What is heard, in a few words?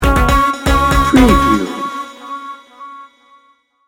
synthetic motion sting bumper